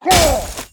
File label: Bone Warrior Attack